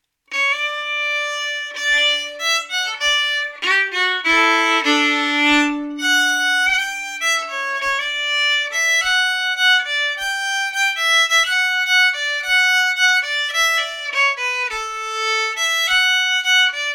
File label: slow; Djavulspolskan